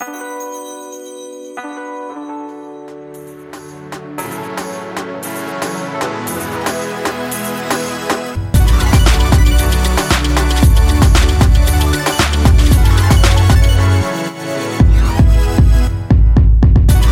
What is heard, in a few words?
Windows 10 Theme